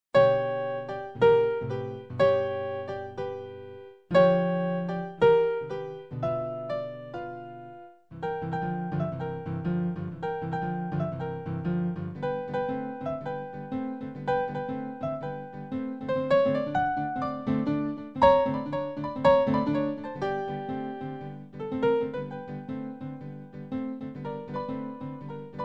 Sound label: Fast Western Blues, piano; piano kurs